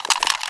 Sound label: draw, weapons, mp9; csgo